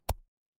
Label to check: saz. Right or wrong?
Wrong